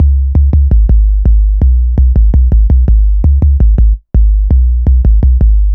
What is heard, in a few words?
808 Standard, Bass Lines 166.5; Jungle; Zero-G Jungle Warfare 1, 2 & 3; 90 s Sample CDs